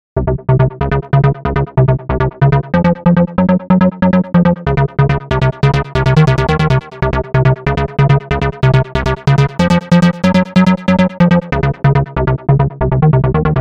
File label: Bass Buss, trance; abx3 trance presets vol3